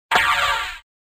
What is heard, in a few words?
wrong; tai lieu tieng anh 7 right on